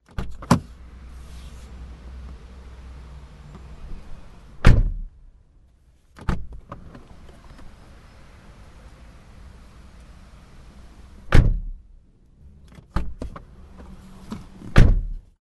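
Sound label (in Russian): звуки дверей автомобиля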